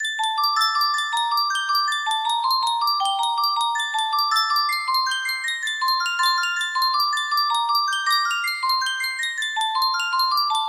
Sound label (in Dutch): Yunsheng muziekdoosje, music box melody